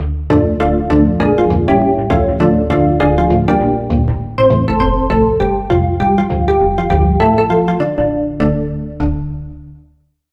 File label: Key; The Grove; Routed Sound; Vital Expansion - Story Walker Vol.1